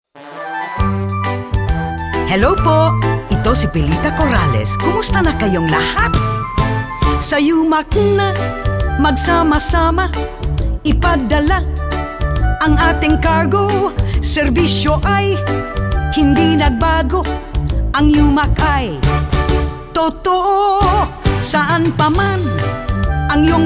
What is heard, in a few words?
jingle; umac